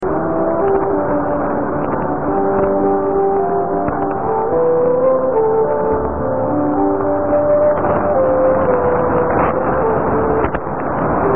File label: 6276 kHz